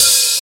clean open high hat sound c key, Royality free open hi hat one shot tuned to the C note